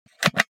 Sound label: Wood jewelry box open